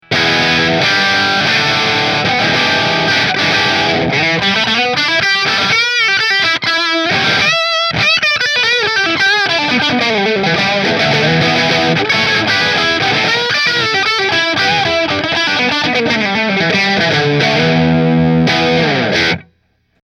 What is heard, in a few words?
Hamer Aztec Gold Bridge Marshall